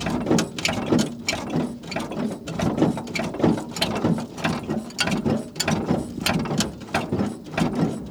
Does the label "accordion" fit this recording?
No